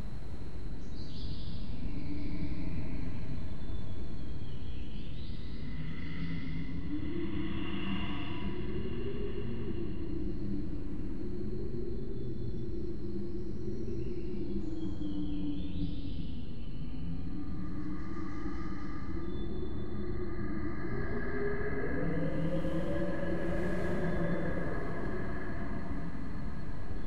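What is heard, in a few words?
Music Caves Ambiance; Experience112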